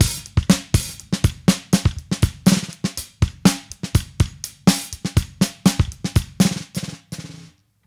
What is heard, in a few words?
Drums, 70s Analog Funk; Sonic Foundry (Sony Creative Software); What it Is!; Super Brown